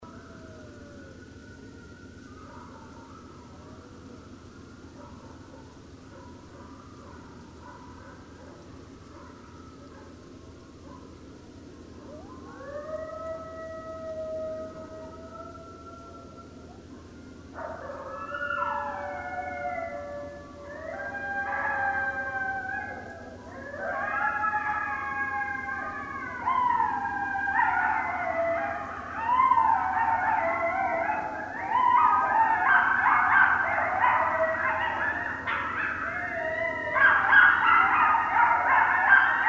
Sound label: Coyotes; Coyotes (Canis latrans)